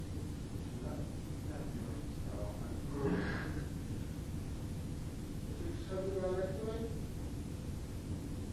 sounds like inhale; This is just one of several clips that had this inhale or breathy sound.; Burn Brae Mansion

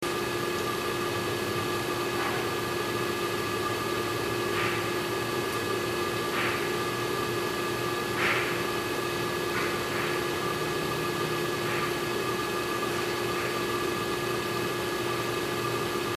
banging; Eastern State Penitentiary, PA; cellblock 10; Banging sound

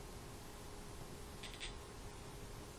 emf buzz

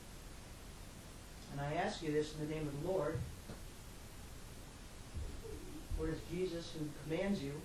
giggle response creepy

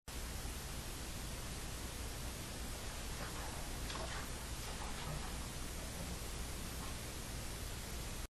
noises amplified

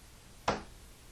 very loud knock

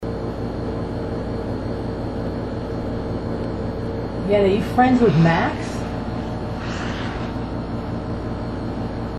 breathy sounds

